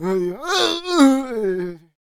Death Vocals; Traveller

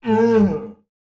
Ice Giant Death Vocals

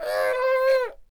Bomb Cannon Death Vocals

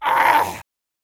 Farmer Death Vocals

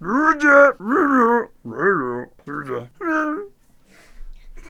Ballista Attack Vocals

